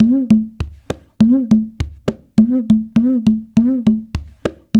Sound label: CONGO, World Congas